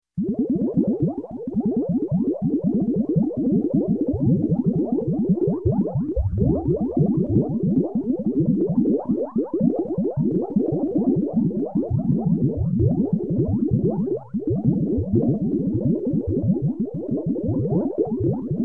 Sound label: Space Bubbles, Bubbling Fast, Electronic; Sound Ideas 2000 Series